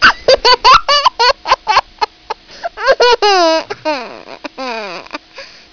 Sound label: baby laughing; children